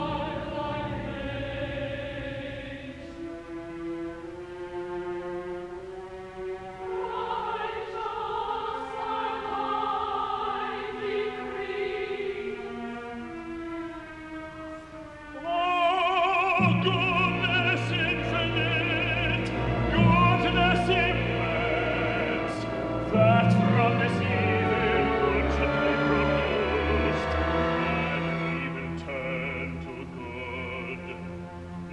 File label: Chorus